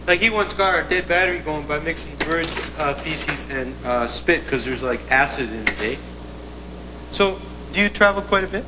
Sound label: battery